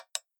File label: group call start; tweb-i2p